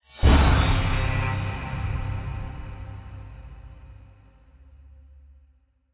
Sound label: small Win; netent